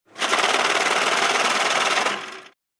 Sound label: Cash counter machine